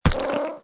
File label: pet zombie squish